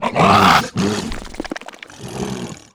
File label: injured